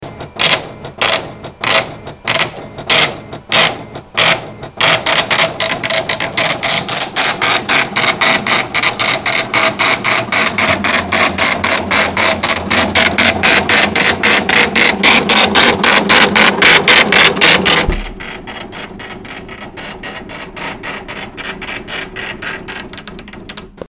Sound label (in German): Punktschriftdrucker